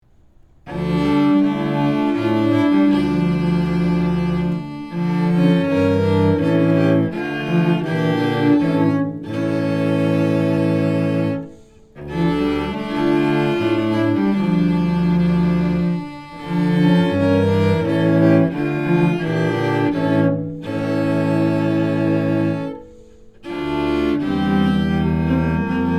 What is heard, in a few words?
Chorale; Chorale 424; Johann Sebastian Bach; Baroque Era , Classical; 4 Cellos , Cello Ensemble